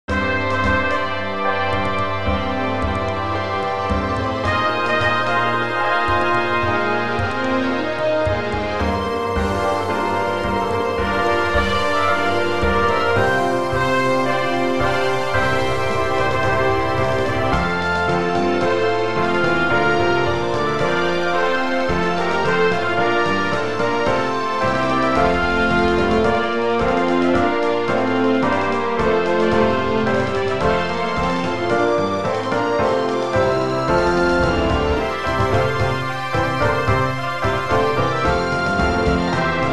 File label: Exit Music